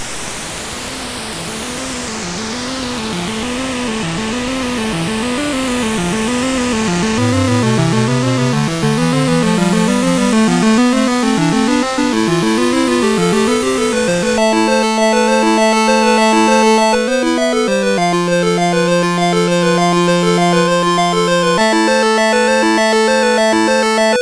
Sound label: Ocean, MOS6581R4; Master Composer